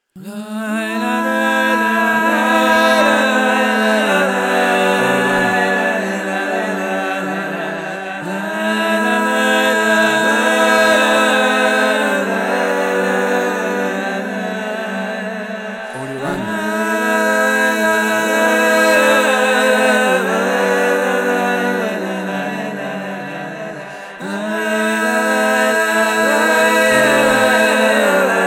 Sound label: Aquatic Vocal Ambience